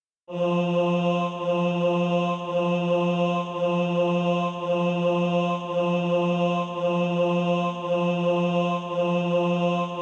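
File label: bass, SATB Practise Music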